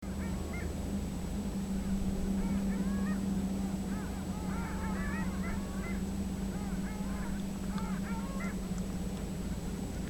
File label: C hyem